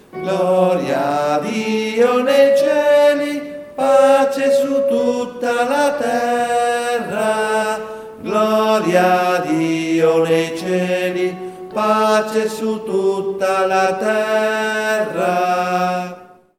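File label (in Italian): Gloria, tenori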